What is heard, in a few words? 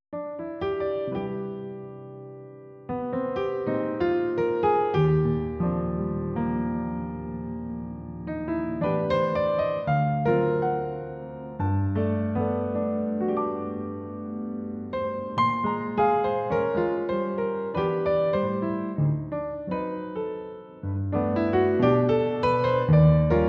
Jazz Piano Bar; Media Right Productions